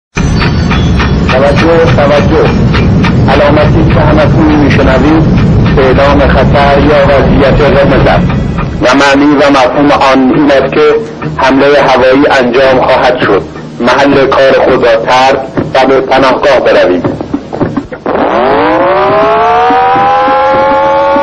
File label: red alarm siren